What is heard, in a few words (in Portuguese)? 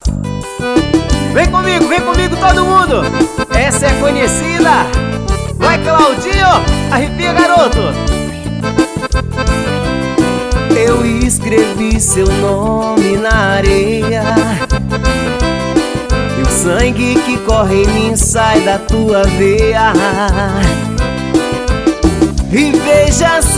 xote